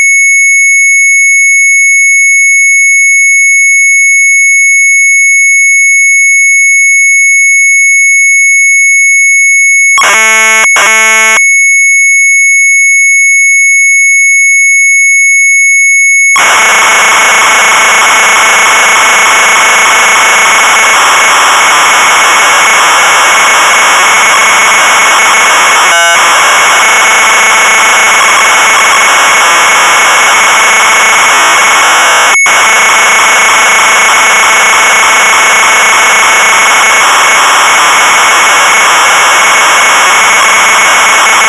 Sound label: MZ800 Tape, sharp